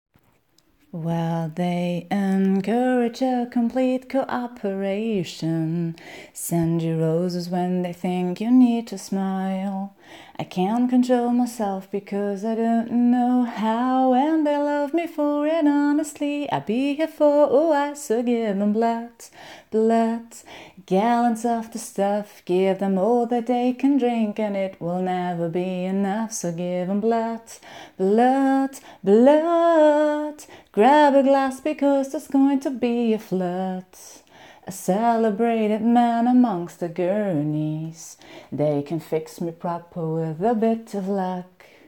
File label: Acapella, Singing; F4M